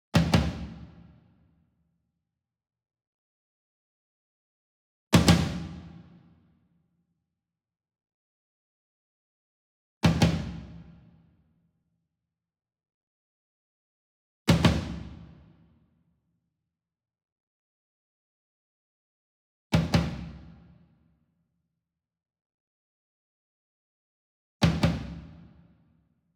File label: SINGLE DRUM Tom Concert Double Hard Beater Medium; boom library; Cinematic Strikes Construction Kit